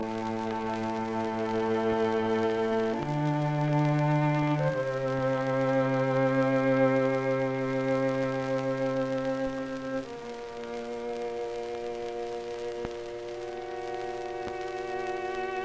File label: String Quartet Litanei; Op 10; Schoenberg